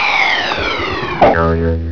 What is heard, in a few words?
wiz boing; EFFECTS